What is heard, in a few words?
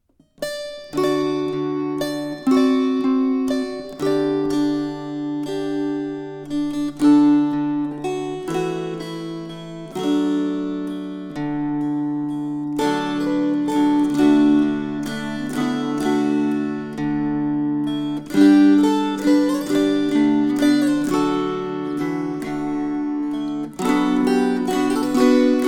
String Choir